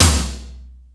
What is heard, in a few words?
MONDO SNR, METALIC DRM; Crypton - DrumBase5000; METALIC DRM2